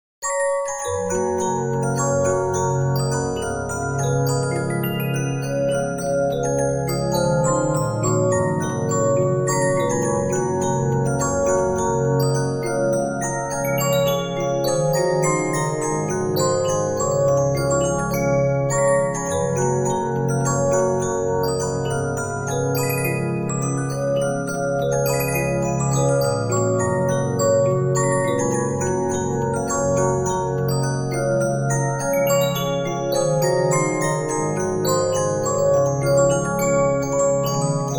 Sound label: Regina, 15inch disc musicbox